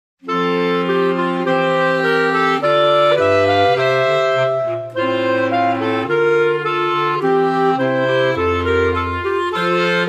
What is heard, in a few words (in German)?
Ohne Worte Intrada